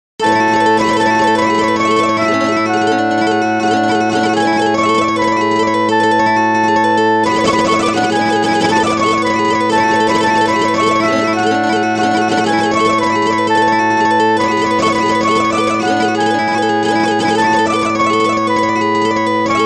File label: oboe trombone and flute harmonies with guitar; fractal music; Sloth canons